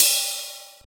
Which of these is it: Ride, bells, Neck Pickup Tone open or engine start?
Ride